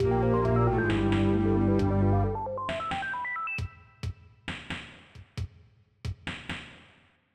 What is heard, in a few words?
level end